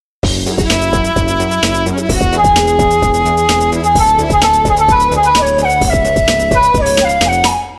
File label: shakuhachi